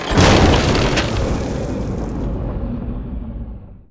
fire; battlecastle

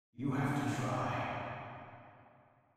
Reverb; Age of Empires III; cinematics

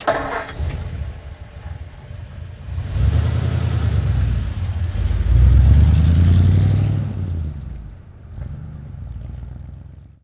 Ford V8 Truck Ext Start Idle Away Slow; Sony Pictures Sound Effects Series; Period Vehicles

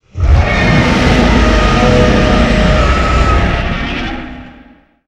Roar & Scream, Vocals Monster; Sony Pictures Sound Effects Series

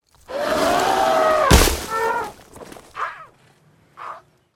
Elephant; Age of Empires III; cinematics

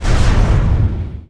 fast hit; RYL 2

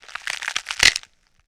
Bone Crunch & Snap; Sony Pictures Sound Effects Series

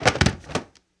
Body Fall Medium, Hand To Hand Combat; Sony Pictures Sound Effects Series

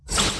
bash; RYL

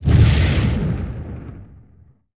Explosion Medium; Sony Pictures Sound Effects Series

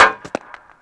Hockey Puck Goal Post Hit; Sony Pictures Sound Effects Series

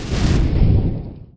fires; RYL 2

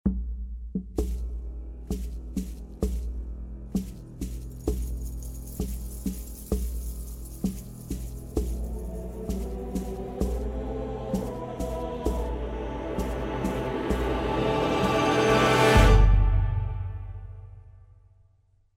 Music

